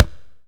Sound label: PK, Indian Percussion Series Tabla; Mango Loops